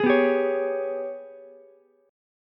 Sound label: G sharp Minor Major 11th G sharp, Ukulele C6; strummed; JGuitar